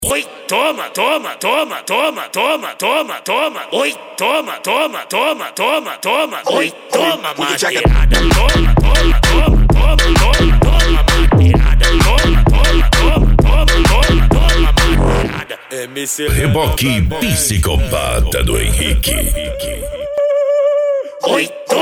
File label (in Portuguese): eletro funk